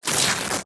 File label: paper wrapping thin rip; foley; zapsplat